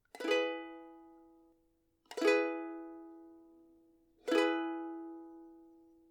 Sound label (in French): Ukulele E Accord